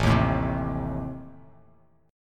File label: A Diminished A down Bass Standard, chord; Adim strummed; JGuitar